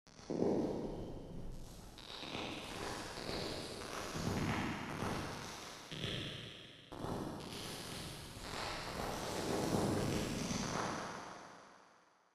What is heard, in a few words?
Large Hall Bright; reverbs; tcworksnative2